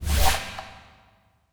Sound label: find match slide out; ui